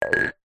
household glass coffee cup thick empty set down upside down tiled floor; zapsplat